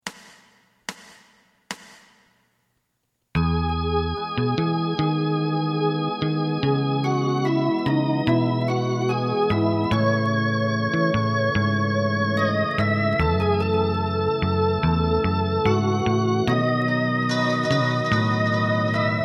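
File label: lead guitar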